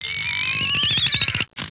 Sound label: Stretch'n'Scream